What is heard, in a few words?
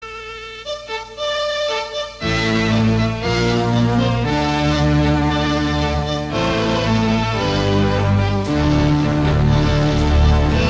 ancient wizard music